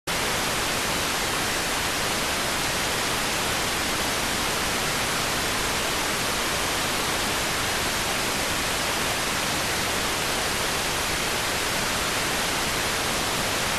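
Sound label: radioteletype receive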